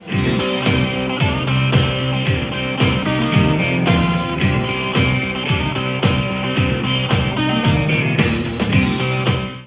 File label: ringer; Ringtones